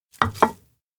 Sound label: cookoff burnt plate; anemoi